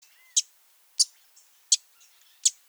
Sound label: wilsons warbler